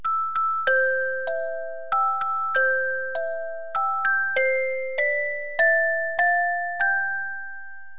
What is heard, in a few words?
lullaby